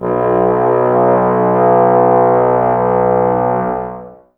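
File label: Brass trombones B; Miroslav Vitous - Woodwind Brass Ensemble; Tbs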